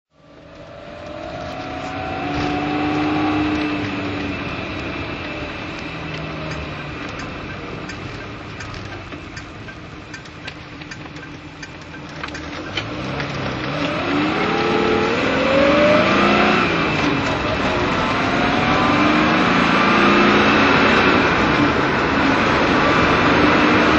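jaguar etype v12ots manual drive, Engine sound driving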